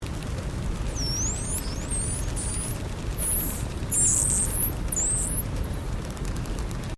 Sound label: atlantic spotted dolphin